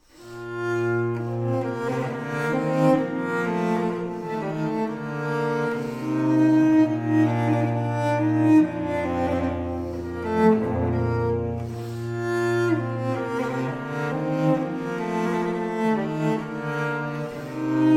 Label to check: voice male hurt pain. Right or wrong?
Wrong